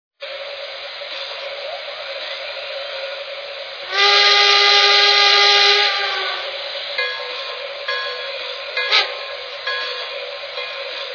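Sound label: allegheny, trains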